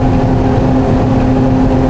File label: convey, ambience; cairn